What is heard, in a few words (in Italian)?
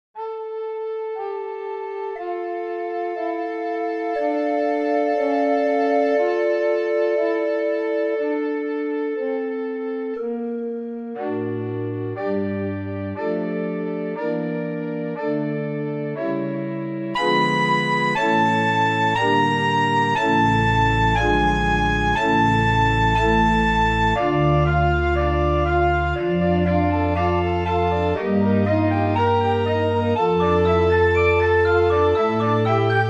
Sonata in Re min per Mandolino ed Organo concertante; enbart orgel